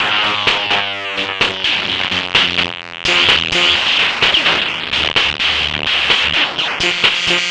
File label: Drums Strange